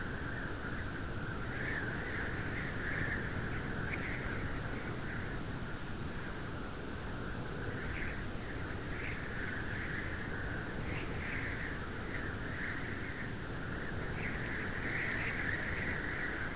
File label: wind; killington